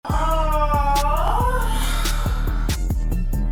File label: aughhh